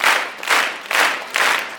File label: Crowds; Vengeance Samples; VEC2 FX